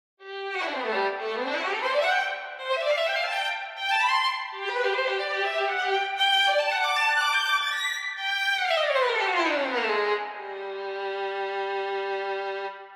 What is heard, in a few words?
Violins Legato Runs; VSL